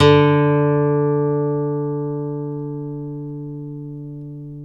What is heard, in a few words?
nylon F D2, guitars; Synclavier Sampler Library